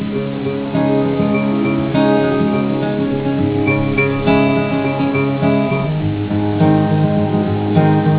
musicalo

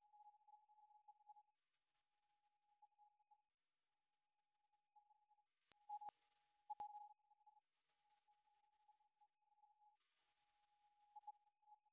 piano 1 layer

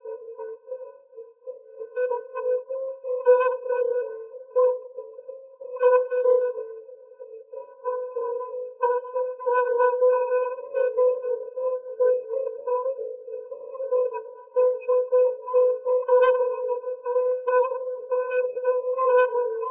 Jazz

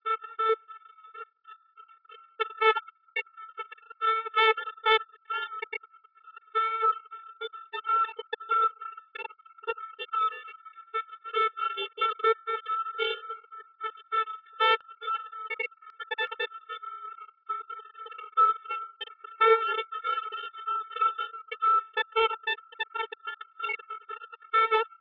Jazz

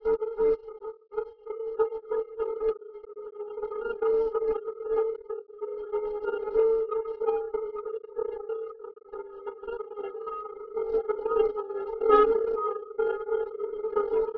Jazz

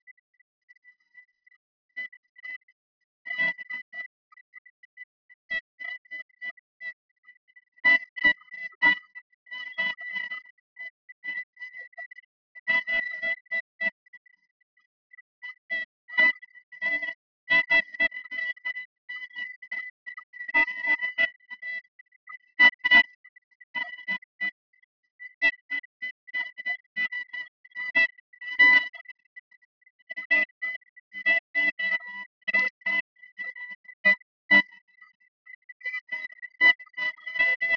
Jazz

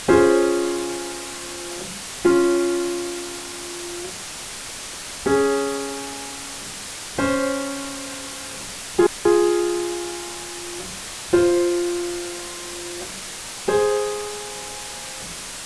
Piano

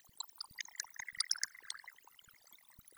denoising glock 0dB mmse

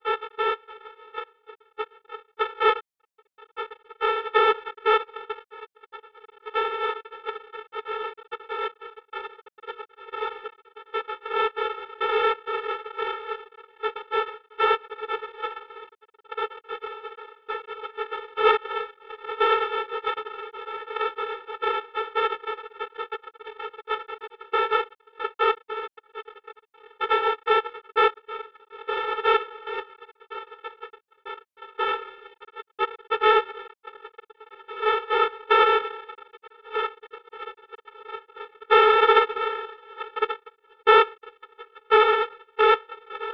Jazz

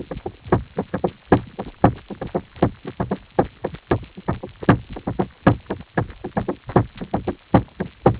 percussion

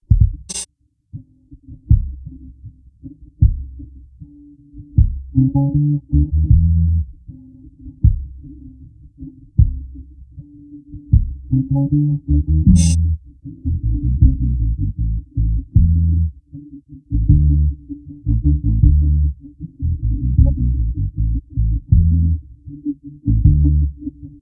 bass

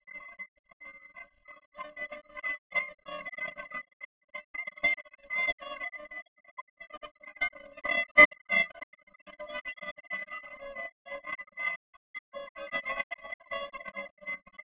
Jazz

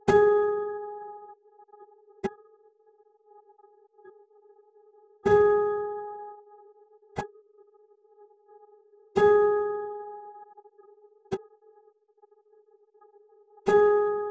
piano NMF

